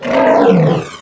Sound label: sight, doom3 pinky